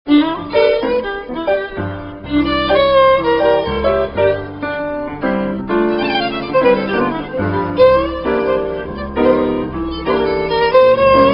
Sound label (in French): violon jazz